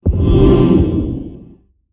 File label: Spell Sacrifice